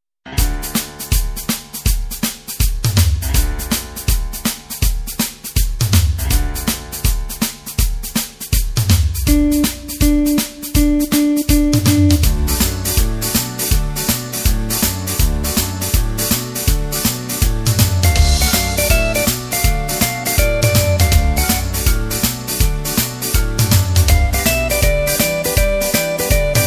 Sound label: Good Old Rock n Roll